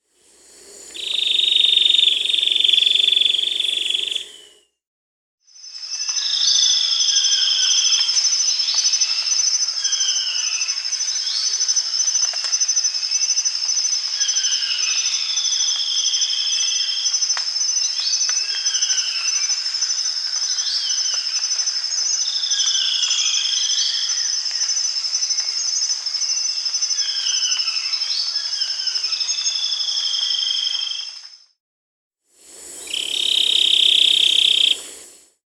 Chestnut-winged Foliage-Gleaner (Philydor erythropterus), Peru; S. America